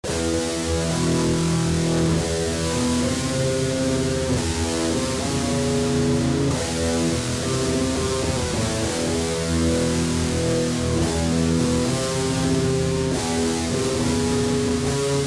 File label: music danger; Ioncross Total War